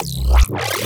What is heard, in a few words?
bass